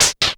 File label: NOIS FX; Back In Time Records; Big Bang